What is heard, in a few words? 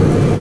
move, Metal; Physics